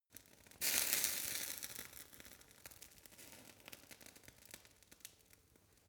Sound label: fire torch sizzle phase